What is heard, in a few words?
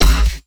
Stab G, bass